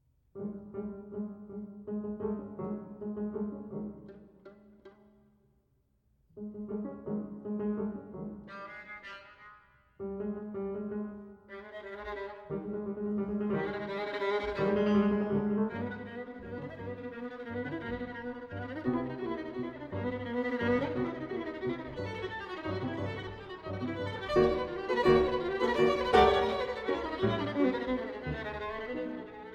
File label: Sonata For Violin and Piano; Ravel; 3. Perpetuum Mobile Allegro